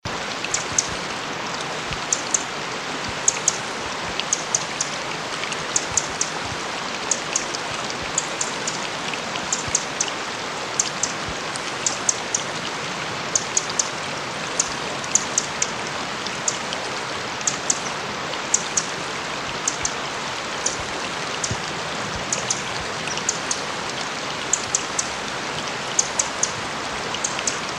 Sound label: CALLS, Wrens Kinglets Gnatcatchers; WIWR; Coons